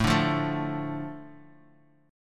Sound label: A Suspended 4th Sharp 5th, Asus4#5 chord; Asus4#5 strummed; JGuitar